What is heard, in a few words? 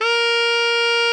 alto FF A#3, saxophones; Greytsounds; Philip Wolfe David Crozier's Saxophones